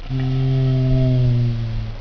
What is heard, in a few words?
AUGH, PEOPLE